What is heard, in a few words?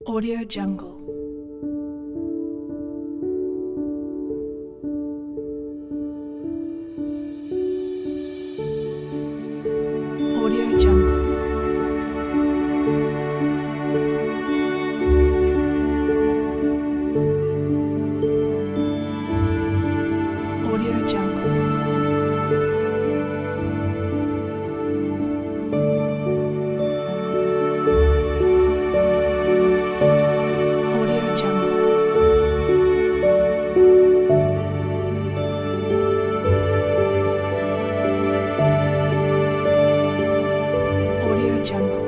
gentle piano kit